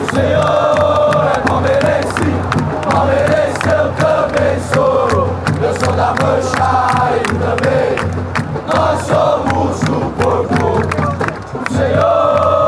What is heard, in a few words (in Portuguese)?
canto, chants; palmeiras